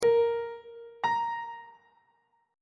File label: g triple sharp note on treble clef